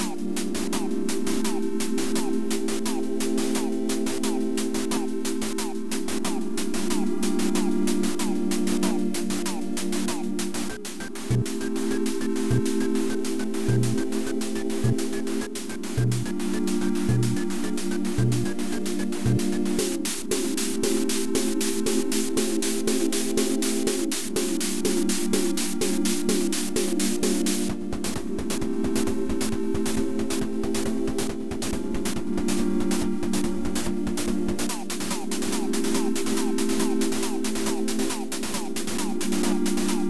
drum set playground; catsynth; linglin art

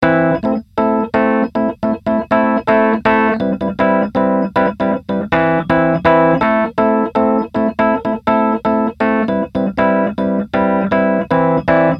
keys dry, no airspace; airspace hybrid reverb and delay; modeaudio

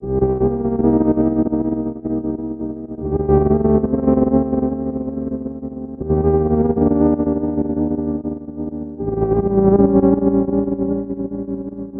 synth on, lofi; lofi drifter; yum audio

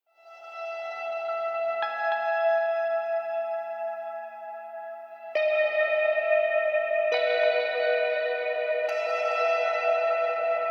echo sky, guitars; panorama guitars by sonora cinematic

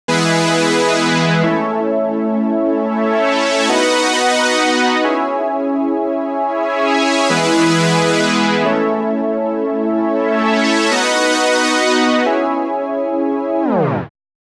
lpf only very slow; e-autofilter300

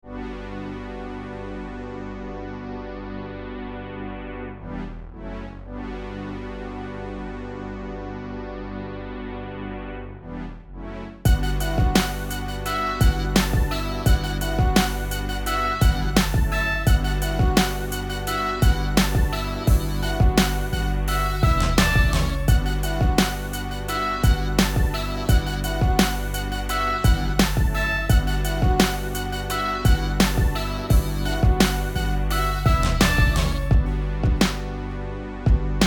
love beat; Modern Billboard 2 by StudioLinked

